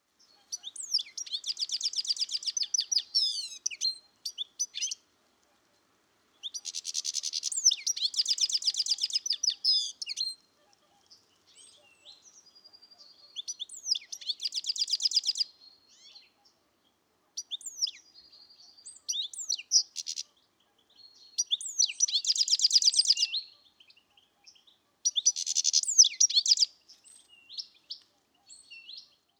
Carduelis carduelis; SETE

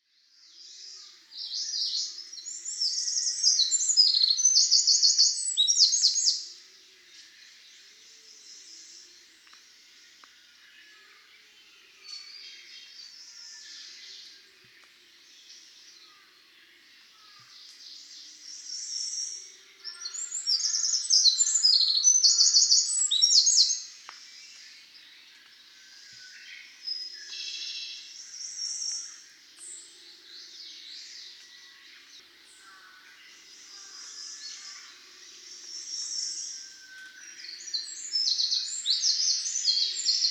Troglodytes troglodytes; SETE

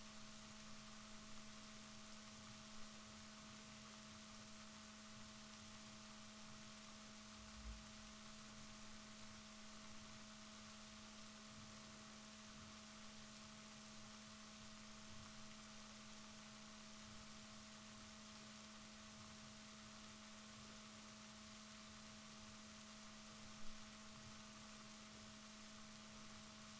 rorquals positif, Balaenoptera Physalus Chile

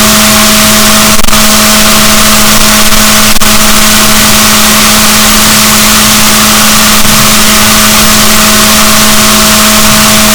rorquals negatif, boat

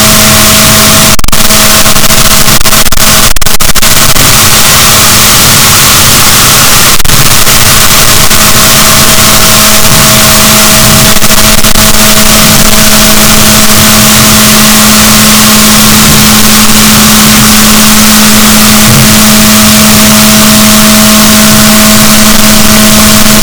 rorquals, Balaenoptera Physalus, boat

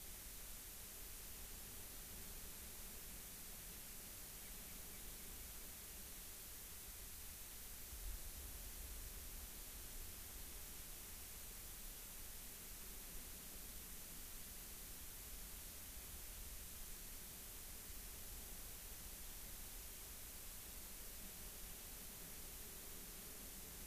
rayol farm; SEAMED

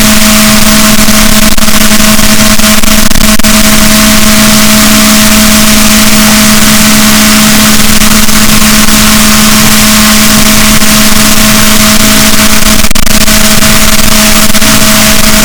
rorquals negatif, boat

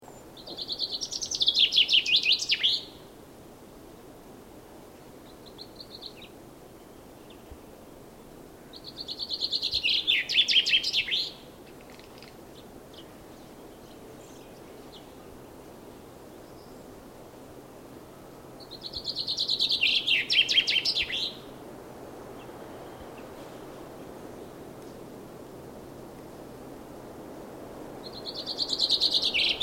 Fringilla coelebs; SETE

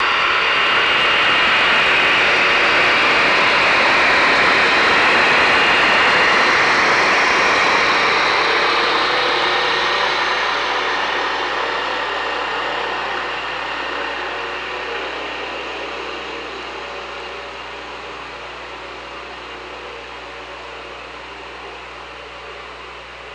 OrcaLab

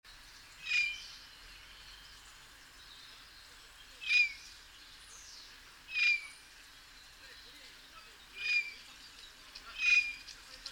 Garrulus glandarius; SETE

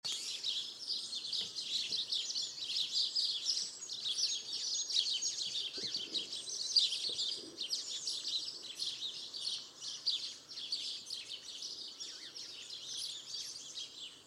Passer domesticus; SETE

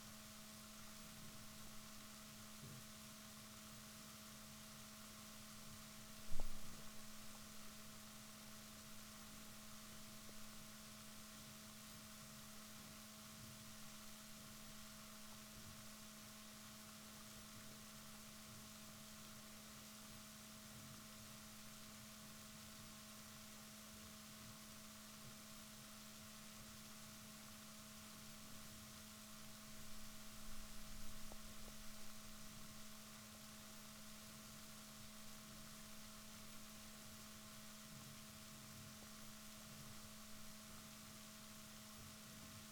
rorquals negatif, Balaenoptera Physalus Chile

